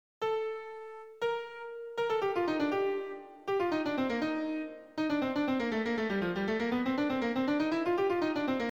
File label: hammerklavier